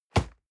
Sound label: box hit hard dry